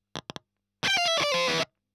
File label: EL Fuzz End